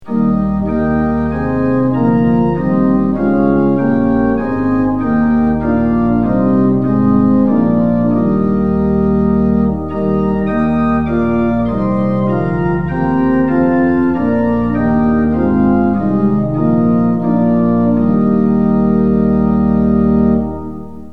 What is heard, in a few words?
tune; hymns; lillenas